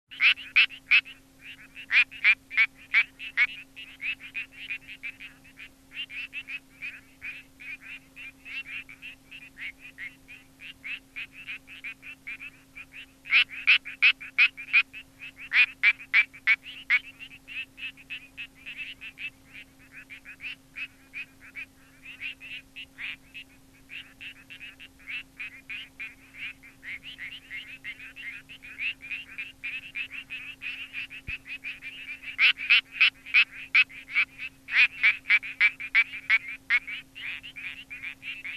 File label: Frog Quacking